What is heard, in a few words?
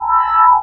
med tone; Dystopia; implants